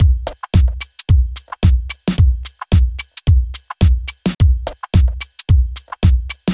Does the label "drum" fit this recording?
Yes